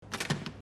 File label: Clic